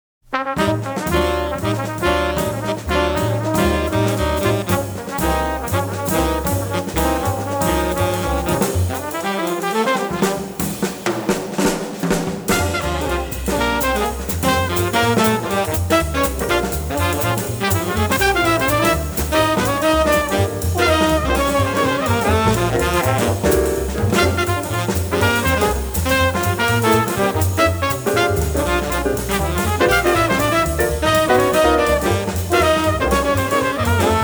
jazz trumpet

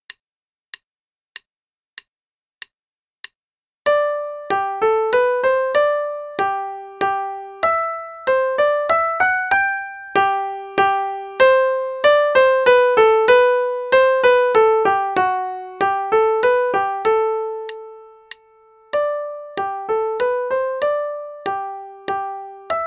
Minuet in G Major (1st page RH only, qn=96); Bach

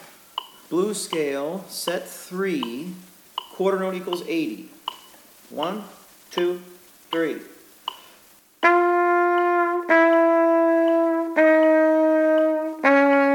Blues Scale (qn=80); Play-along

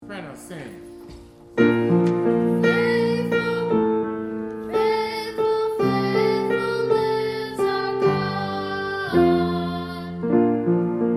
Soprano part

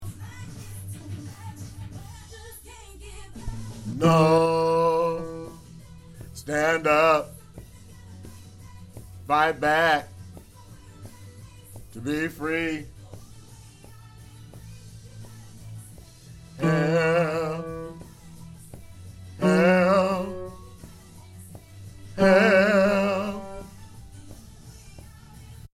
Bass

